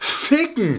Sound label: death; spackenmobs; apored